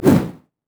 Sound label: burning agony; Dr. Mundo